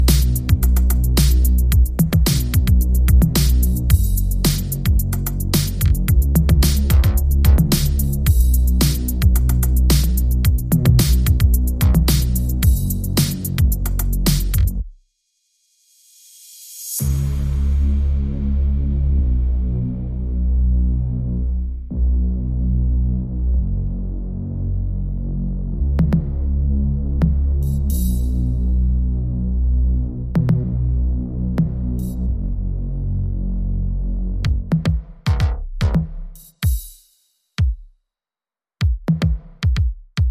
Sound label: Drums and Bass Only